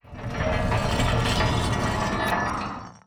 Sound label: mine metal expire